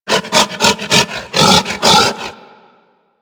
Kubrow Feral Vocal Breath Run; Warframe

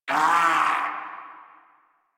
Pain, Dialog, Barks, Battle; en; Warframe; Grineer

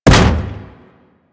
Arachnoid Camper Step Default; Warframe; Corpus

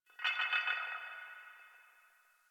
Exterior Man Hole Drag; Warframe; Ambience; Nineteen Ninety Nine; DE

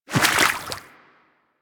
Infested Small Walk Water, Footsteps; Warframe

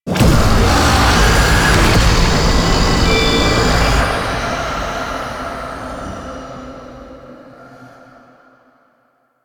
Struggle Prompt End; Warframe; Lotus Eaters; Quests

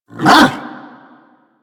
Bark, Kubrow; Warframe; Tame

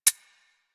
Tommy Mech Clip C, Weapons; Warframe; Tenno; Tommy Gun

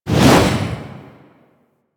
Spear Throw Fire, Weapons; Warframe; Tenno; Priest Spear

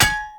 Hammer Impact Shield; Weapon Impacts; Player; Combat; Tuvalkane Hammer

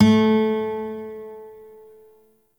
Shawzin Oct One A; Warframe; Lotus Shawzin

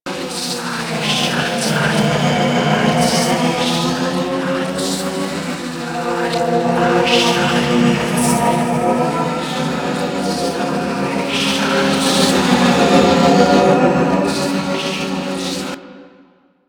Spirit Chant Hum Loop; Props; Warframe; Ambience; Sentient Devourer

